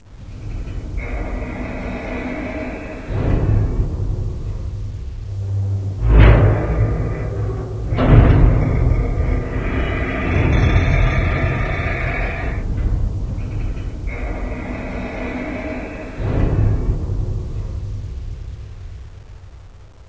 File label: pipe stress, ambience; caged